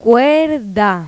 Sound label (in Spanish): CUERDA; Pipo-Aprende musica con Pipo